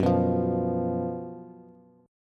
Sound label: Gb Major 7th Suspended 4th, down, Bass; strummed; JGuitar